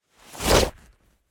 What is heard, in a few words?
Whoosh Cloth Leather Fight; Just Whoosh 3; soundbits